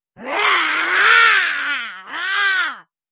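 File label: goblin sound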